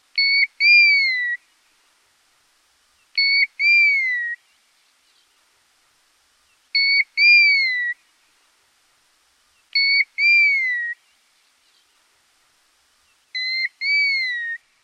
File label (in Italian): canto